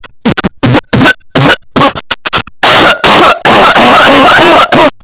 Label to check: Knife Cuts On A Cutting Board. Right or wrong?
Wrong